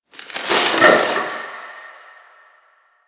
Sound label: Box Of Trash Rustle; Warframe; Ambience; Nineteen Ninety Nine